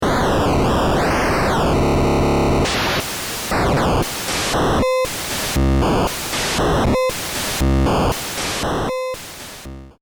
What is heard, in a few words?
pitched noise